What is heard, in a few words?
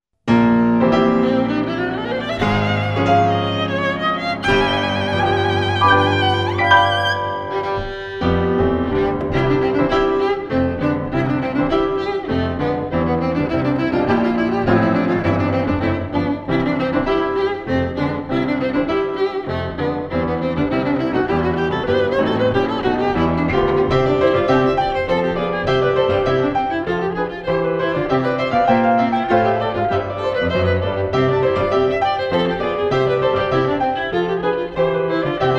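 violin sonata; sinding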